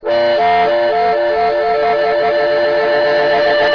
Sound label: Octave Shake